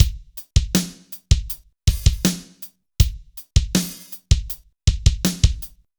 BPM 80 AMB

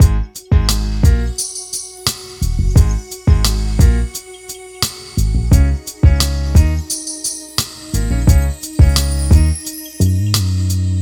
MIX, Hip Hop and R&B Drumloops; Best Service ProSamples vol.01; AKAI

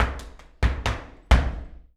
Flamenco Tapping Zapateado Solo; Best Service ProSamples vol.46

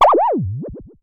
Synare Filter; Star Instruments Synare 3

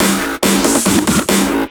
Fills, Loops 140 BPM

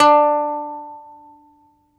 BALALAIKA, Russian Strings

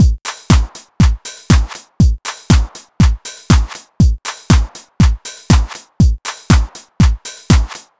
120 trance beat, Drum & Perc Loops

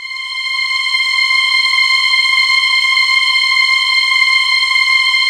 JV TRON CHOR; VINTAGE-EXP

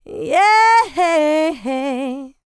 LICKS, Vocal Planet, R&B; ILIO; Dance; LT R+B

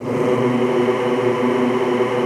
B1-L, Extended Classical Choir; Best Service

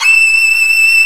Arco Violin E6; Multisamples; Proteus 2000; Digital Sound Factory Proteus 2000

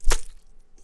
Splatt, cartoon Foley Fx; musicradar; cartoon caper

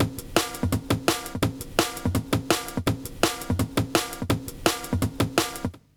LOOP, Explosive Jungle; USB Soundscan vol.05; AKAI

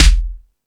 Discoball, Drum Machines E-MU Pro-Cussion

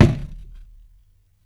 Foley lo

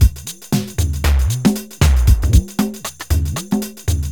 real linn, Linn Linndrum

